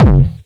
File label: bassdrums, techno; Techno ID; single-drums